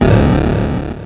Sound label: Atari Noise Long, Atari 2600